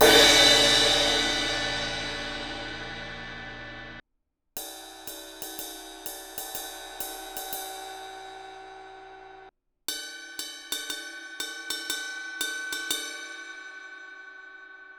zildjian 20 inch a custom rezo crash crash ride bell